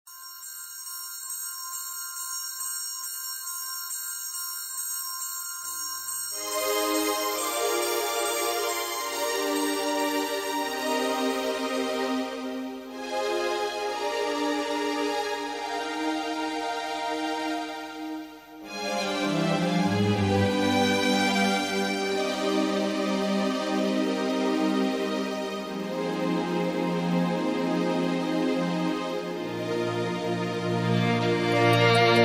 Morning Bells

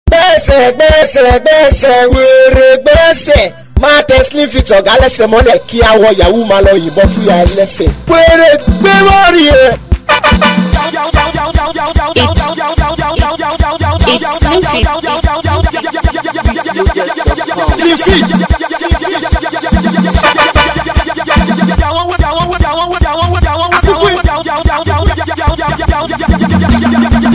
Free Beat

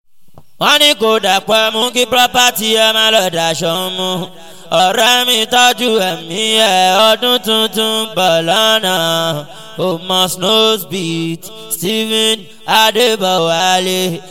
Free jingle

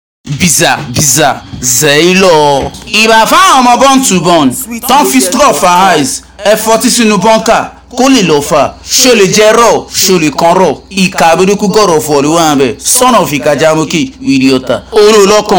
jingle

